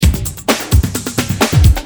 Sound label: Drums, Perc, Industrial